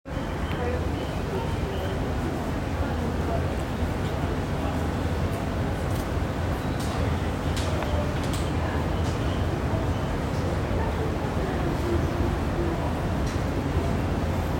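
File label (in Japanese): 山手線 五反田 counterclockwise 予告, train sounds; JR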